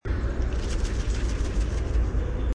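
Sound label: Pileated Woodpecker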